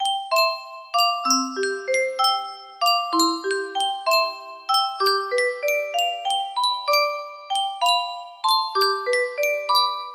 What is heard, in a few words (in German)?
yunsheng spieluhr